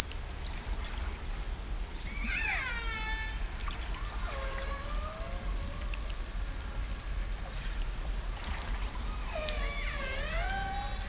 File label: hydrophones, lime kiln